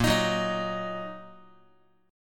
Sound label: Bb Suspended 4th Sharp 5th Bb down Guitar; Bbsus4#5 strummed; x,6,6,4,7,x